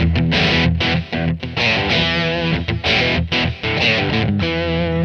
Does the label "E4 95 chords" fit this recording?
Yes